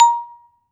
SHORT VIBRA A#4; AKAI S-Series CD-ROM Sound Library VOL-7

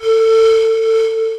pan pipe; Voice Crystal - Steve Reid Definitive Percussion

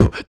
Human Perc, Body Perc

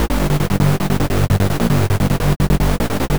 Mo Hoov 150 G, future rave samples, 150bpm

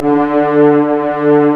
FRENCH HOR D3, HORNS; East West - Global Sounds

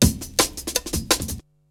BREAKBEATS, TECHNO

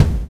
Extract Kick; Sonic Foundry (Sony Creative Software); Drums & Drones; Construction Kits

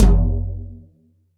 sfx perc

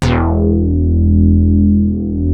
Prophet 5 E2, Synthesizer; Hollywood Edge - Giorgio Moroder Rare Synthesizer Collection

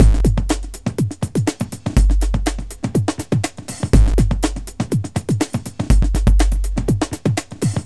Beat, Drums & Percussion; Electrocution; Beats

